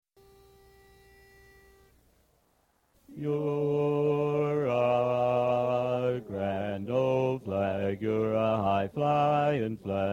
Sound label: Bass